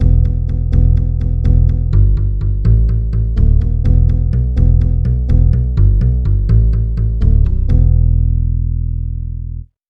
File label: acoustic bass bass